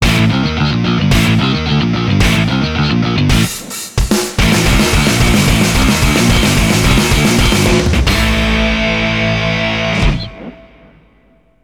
BGM Jingle Title; Kenka Banchou 6 Soul Blood; 3ds

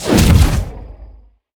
Cannon Explosion

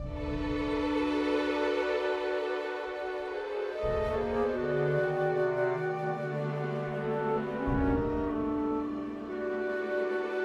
Cinematic Music; Lost In Space

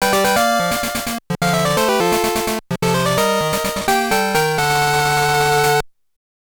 Fanfare - Pokédex Evaluation... Complete!

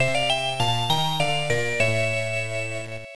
Pattern Clear; Quarth; MSX2

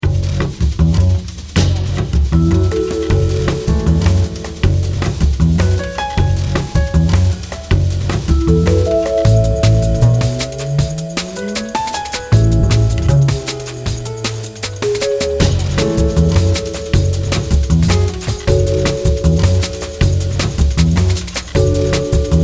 Electronic; American McGee Presents Bad Day LA; Windows

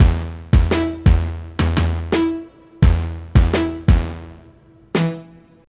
Loops - Dance Head Nod; Scratch

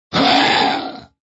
attack medium; Monster Arena

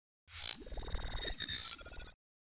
Remote Frequency Without Antenna

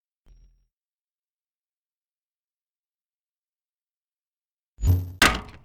Metal Part Valve hit; Lucius III

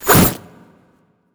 Judgement Chain Bind; helltaker

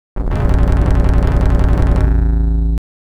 Stage Clear (Gate Open)

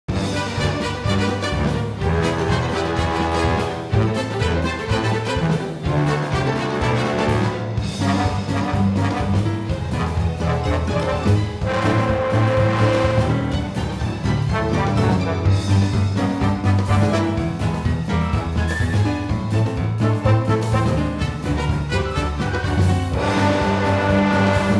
Hoedown; don t starve together; Moose

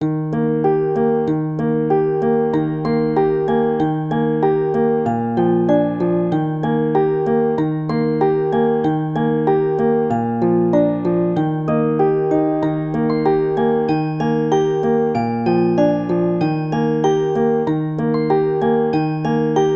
London Bridge Inst; purr pals 2007 nds

